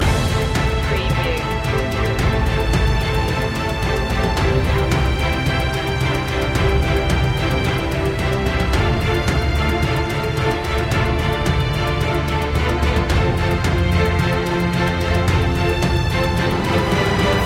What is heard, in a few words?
victory in the battle loop; media music now